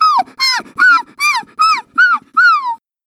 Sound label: dog whine